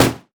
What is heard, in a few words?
blue minion mech basic attack; LoL